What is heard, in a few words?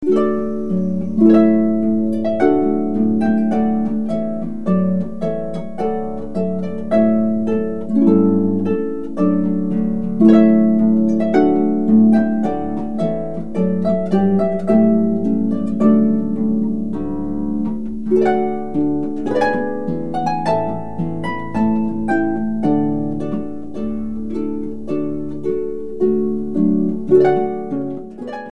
trumpet voluntary